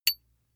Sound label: small metal impact medium high; industrie wirtschaft; metall